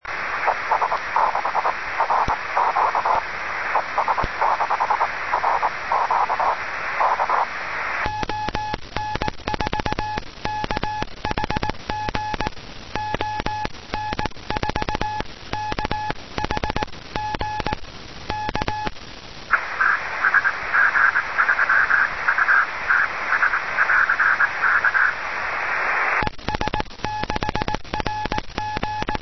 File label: 2m Aurora